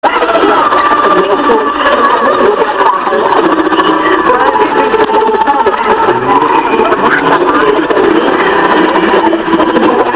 Signal interference China Radio International BBC 15280 khz; BBC's emergency radio service for Sudan and Gaza